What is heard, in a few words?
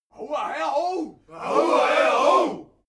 native american shout